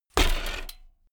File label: bmx bike land impact rattle